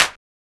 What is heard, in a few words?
Analog Clap